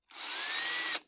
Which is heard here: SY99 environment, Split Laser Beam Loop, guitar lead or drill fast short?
drill fast short